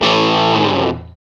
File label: GTR Crunch, Dirty; Club-50 - Foundations Roland